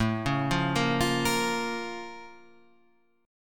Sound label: Bb Minor A; Bbm/A; arpeggiated; JGuitar